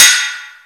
EL HH OP, ELEC.DRUMS; Crypton - DrumBase5000

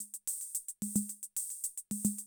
CR78, DrumLoops

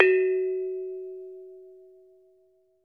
METALOPHONE; Metalophones; E-MU Producer Series Vol. 7 – Old World Instruments

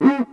Open Cuica, Percussion; MARKYDRUMS

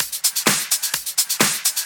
Clubhouse Loop 128 BPM

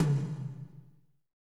tom, lite jazz, Roland; Northstar; Drumscapes Roland; AC Lite Jazz; Toms

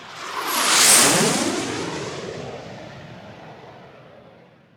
fx reverse; Ueberschall; Techno Trance Essentials

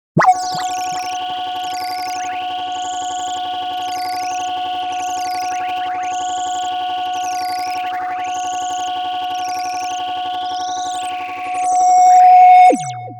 Planetary Machine Computer; Bizarre Planet

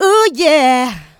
Vocals, Adlibs; Houseworx

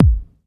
BD, Dance Mega Drums, HIP

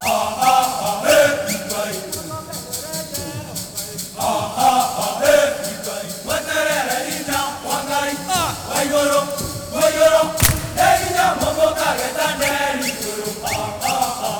Heart of Africa; Spectrasonics; Heart of Africa 2

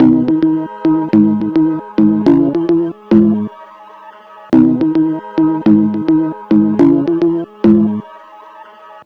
LoFi Bass; Sonic Foundry (Sony Creative Software); Club Joints and Street Anthems; Lions Den

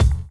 BD; Best Service; Dance Mega Drums

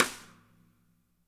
MED SNR, TRASHY KIT; Spectrasonics; Burning Grooves CD2

